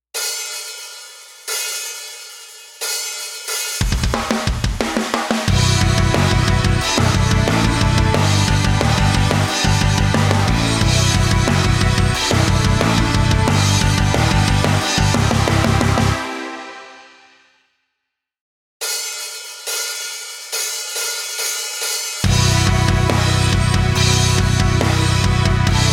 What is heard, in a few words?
karaoke